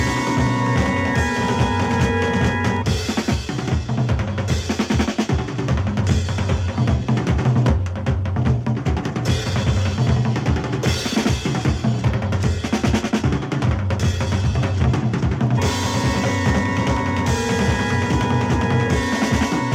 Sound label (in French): Batterie